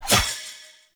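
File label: Long Blade Projectile Rune Sword Throw; Powers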